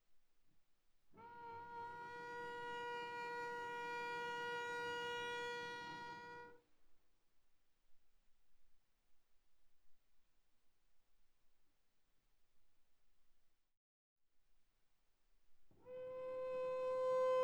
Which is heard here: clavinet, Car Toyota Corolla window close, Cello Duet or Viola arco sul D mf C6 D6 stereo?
Viola arco sul D mf C6 D6 stereo